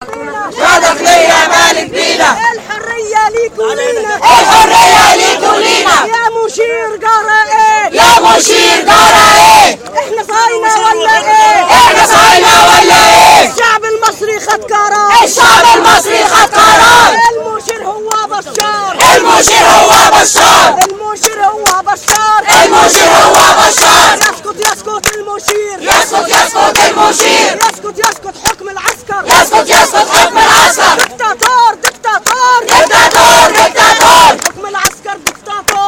chanting against egypt's military rulers in tahrir